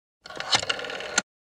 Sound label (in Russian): phone